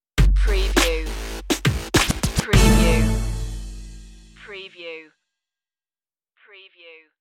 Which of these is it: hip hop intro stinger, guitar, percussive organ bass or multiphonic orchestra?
hip hop intro stinger